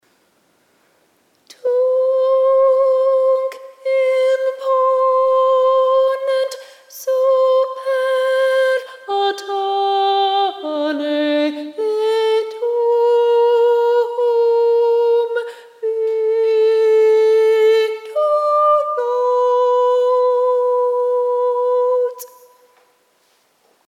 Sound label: first soprano; Allegri last page chorus Soprano 1; Lent/Holy Week 2013 Rehearsal recordings